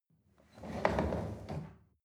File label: chair wheel roll on floor soft